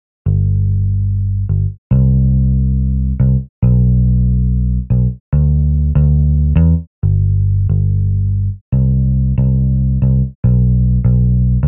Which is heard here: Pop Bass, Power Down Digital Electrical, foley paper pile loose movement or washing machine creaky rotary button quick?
Pop Bass